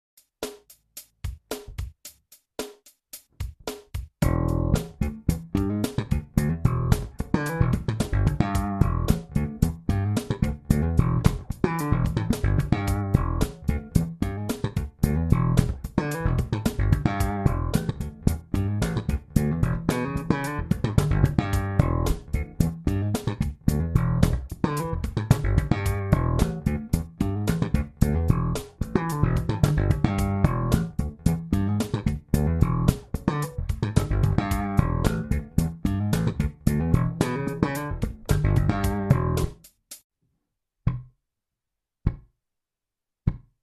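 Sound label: E7 slap bass with double thumbing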